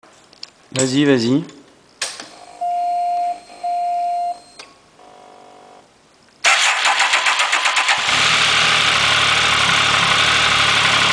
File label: speed triple, triumph